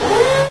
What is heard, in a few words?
tank turret start, vehicles; Source Engine; video game